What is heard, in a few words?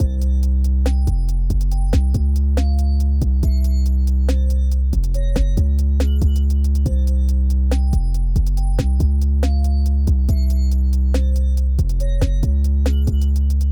Cm 140BPM 808 Bassline